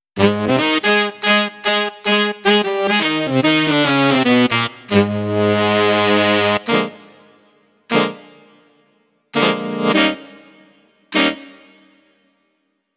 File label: Sax Section; XPression